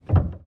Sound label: piano release